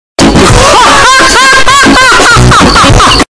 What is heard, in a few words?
muhahahaha